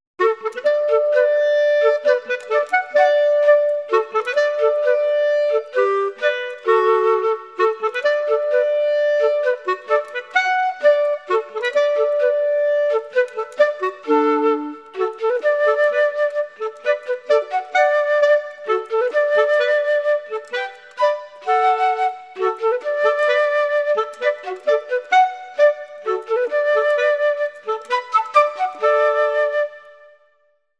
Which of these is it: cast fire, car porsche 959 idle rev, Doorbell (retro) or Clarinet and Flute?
Clarinet and Flute